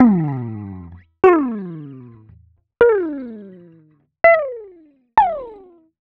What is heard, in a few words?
Guitar Slide Effect Down